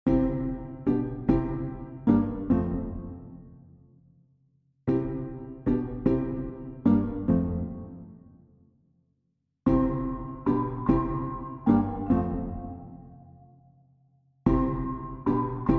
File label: Piano and Mallets Only